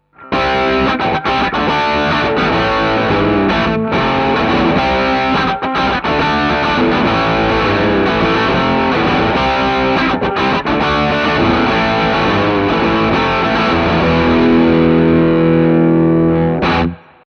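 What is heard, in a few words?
API512c Shure SM7B Electric Guitar Chords; ssl six ch channel strip 500 series preamp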